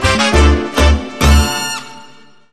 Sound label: Hit